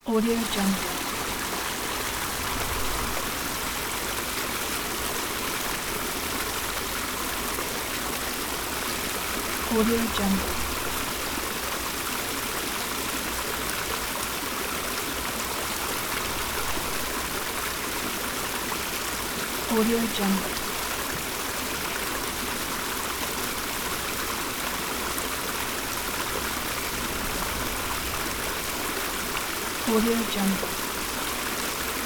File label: water fall sound